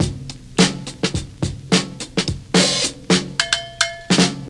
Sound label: 72 bpm fresh drum loop sample f sharp key